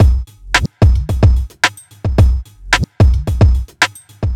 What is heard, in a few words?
r n b kit 110